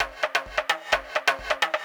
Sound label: percussion, 130, drum loops